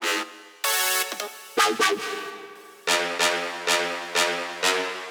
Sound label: Tek Synths